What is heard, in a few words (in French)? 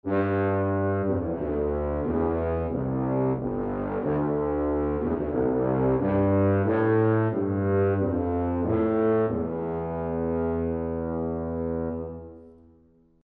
tuba; borodine